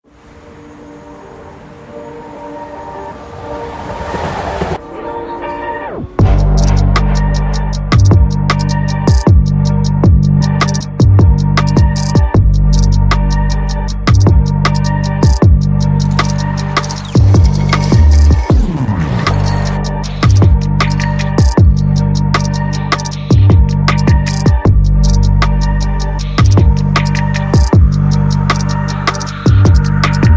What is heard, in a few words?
street files; julez jadon; saucey